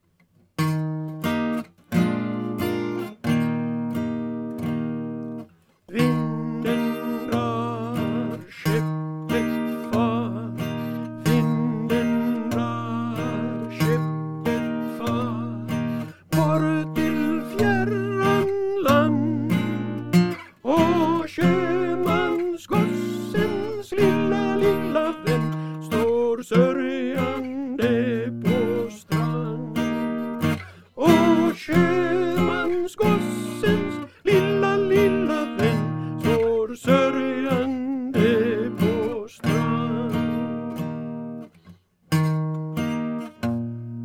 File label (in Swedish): vinden alt